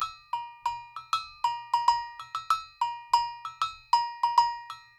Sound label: NYC Percussionworks; Sampleheads; GJ LOOPS 96